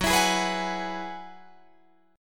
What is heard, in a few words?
Gb 9th Suspended 4th Gb, Guitar Drop D; chordshape; down